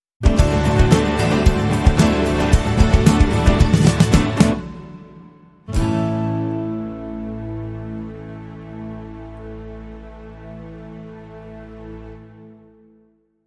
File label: Swoop and Swirl Sting No Lead Guitar